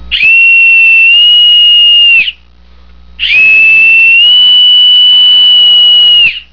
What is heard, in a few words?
away, bosun